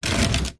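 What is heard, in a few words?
household plastic esky slide slow short on slightly gritty concrete patio; zapsplat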